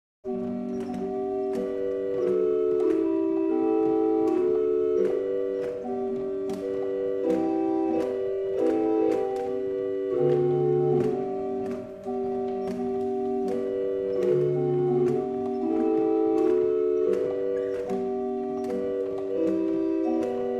barrel organ; Liddington

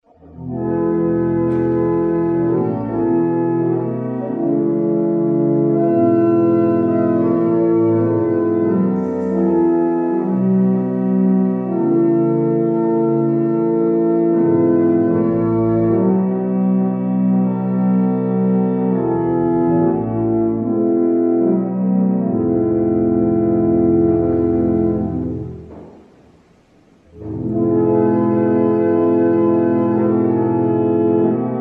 Chant, barrel organ; Barnston; Mornington

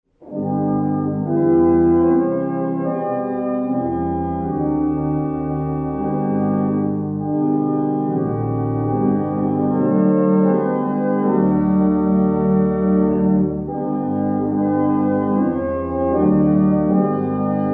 barrel organs; Barnston; St Alphege

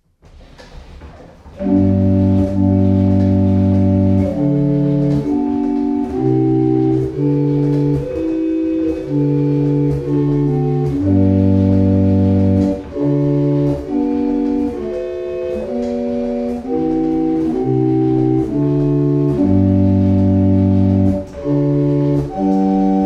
barrel organs; Boxford; Dublin

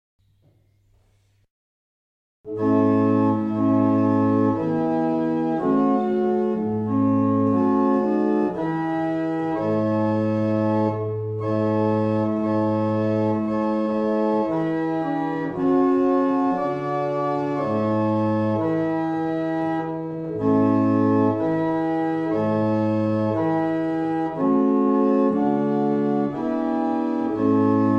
Old 100th, barrel organs; Mattishall

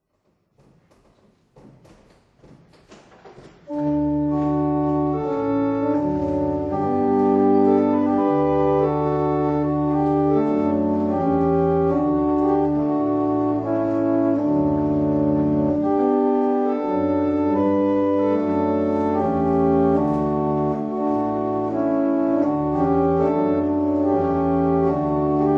Easter Hymn, barrel organ; Letheringsett

